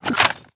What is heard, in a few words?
ar2 reload push, weapons